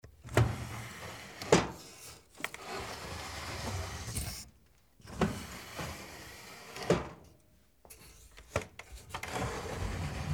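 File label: sliding door; hikido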